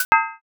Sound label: use key